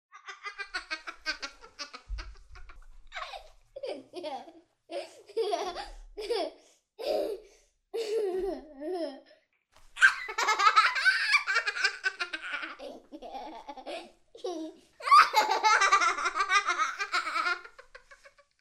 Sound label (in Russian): zarazitelno smeetsja rebenok